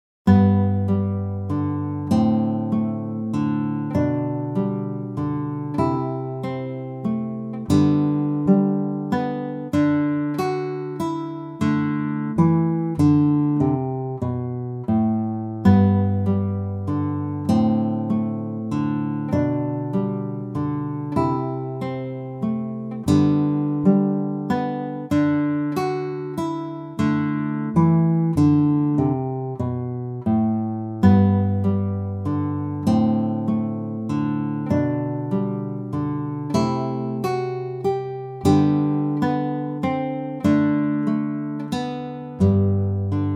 exercice sol majeur

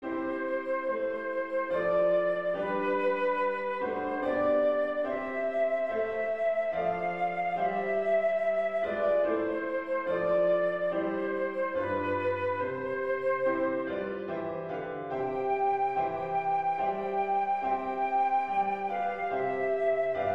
hymne national anglais

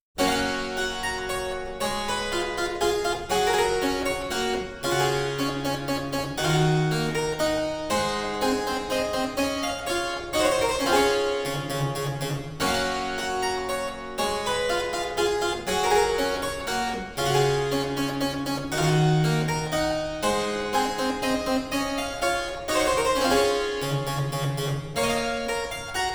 cornes chasse

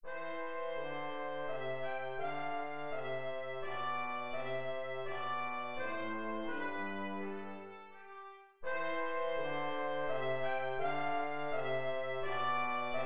menuet majeur; bach johann christian

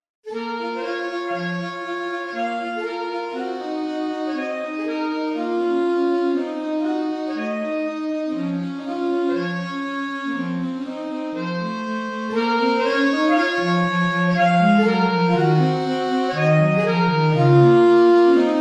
duo pour saxophones; vignon denys

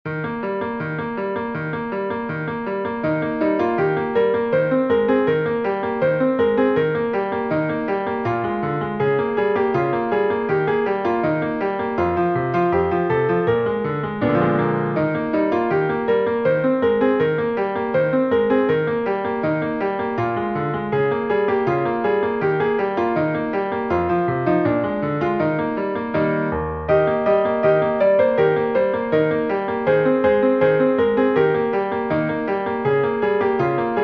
vieil air; dorian

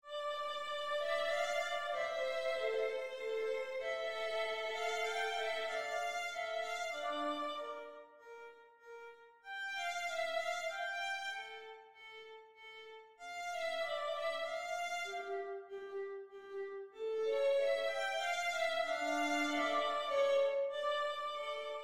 menuet en re mineur

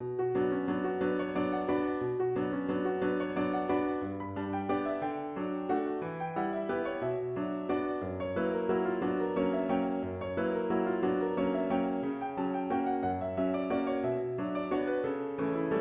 valse salon

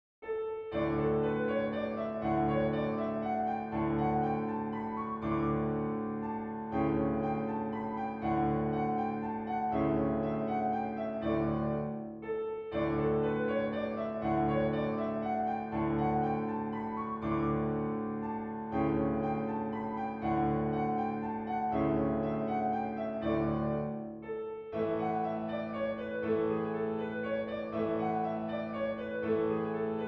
danse allemande; beethoven ludwig van

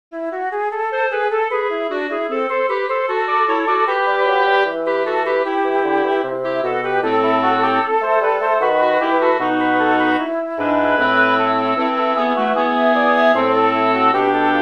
pour quatuor a vent; Dieterich Buxtehude; buxwv 221

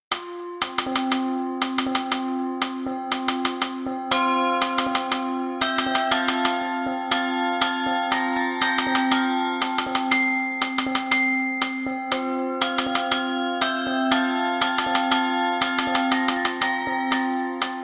the drum carol, tambour pour clochettes anglais tambour piege; davis katherine

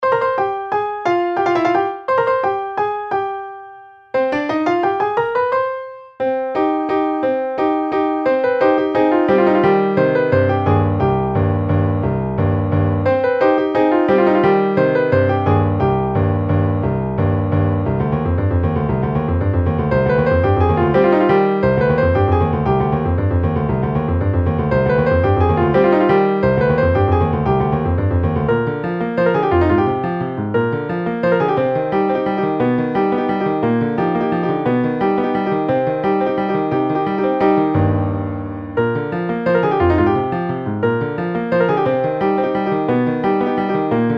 marche funebre mineur

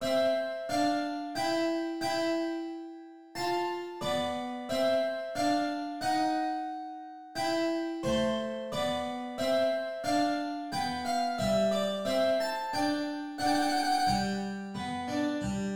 menuet

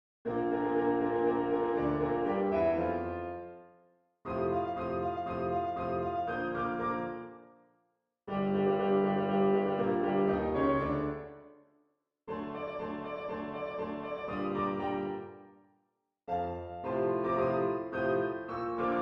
hymne national italien

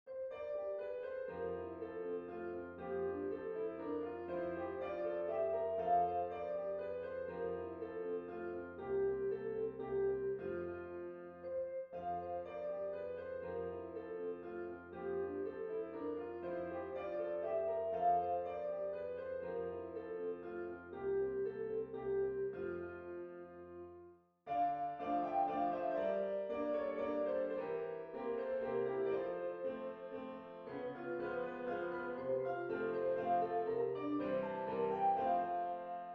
valse fa majeur; beethoven ludwig van

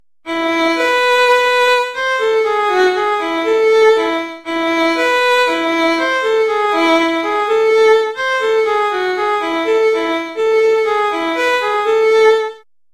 chanson a danser

